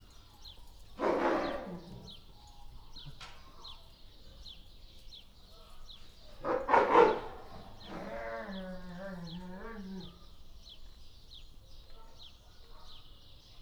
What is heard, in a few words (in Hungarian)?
etetés előtt, orvosmedve; debreceni zoo 2012; marantz puska